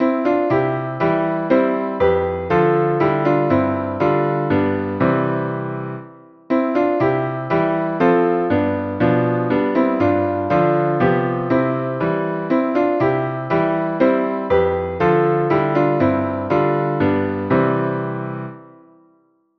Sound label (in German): Klavier